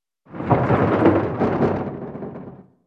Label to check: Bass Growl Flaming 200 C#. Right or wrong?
Wrong